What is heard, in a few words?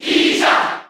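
Cheer Japanese; Dr. Mario; SSB4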